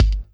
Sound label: BD; Best Service; Dance Mega Drums